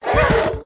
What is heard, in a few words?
Grizmur Die; pets